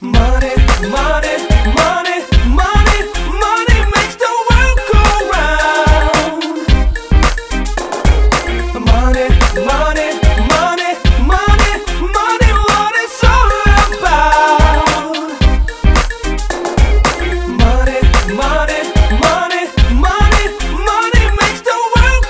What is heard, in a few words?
Money Ringtone; UK Punjabi